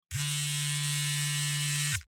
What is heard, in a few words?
Electric razor